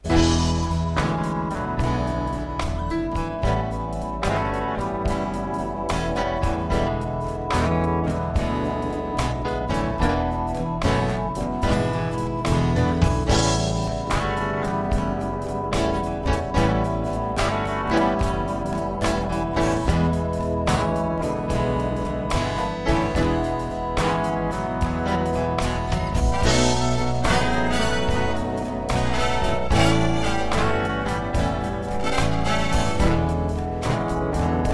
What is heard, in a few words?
Blues